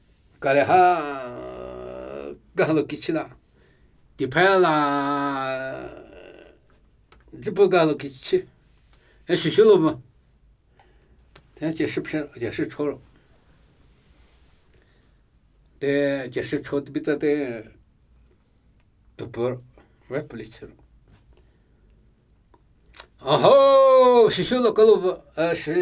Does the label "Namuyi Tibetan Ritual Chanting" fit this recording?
Yes